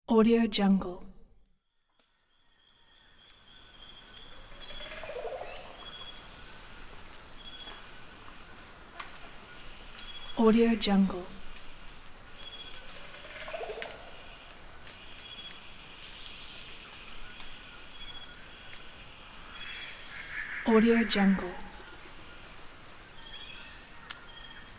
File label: forest night